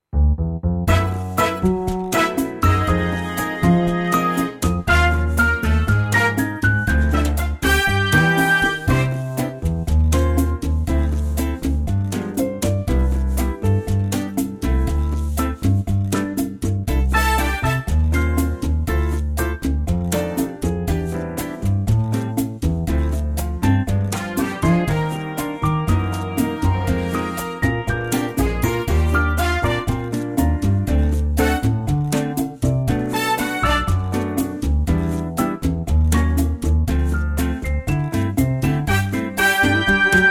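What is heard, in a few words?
C, Backing Tracks for Male Vocals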